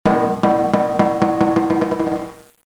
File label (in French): Balle rebondissant sur gamelle